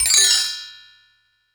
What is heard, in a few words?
technox perc; Quasimidi Technox